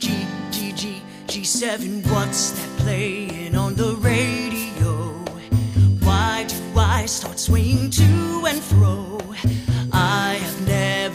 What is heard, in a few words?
guide vocal